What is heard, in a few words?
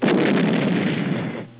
Explosion